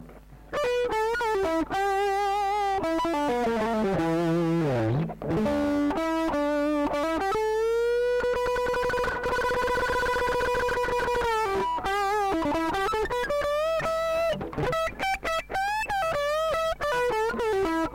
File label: guitar solo, rock; ringtones